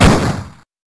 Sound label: pistol fire; duke